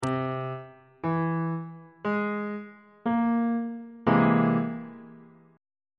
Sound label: b sharp augmented 7th chord on bass clef